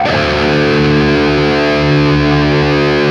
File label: LEAD D 1, Guitar; East West; Steve Stevens Guitar Samples Collection